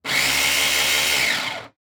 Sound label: Household Kitchen Appliance Pulse Food Processor Short